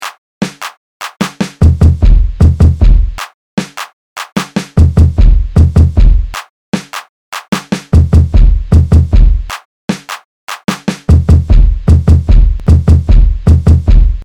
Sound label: club track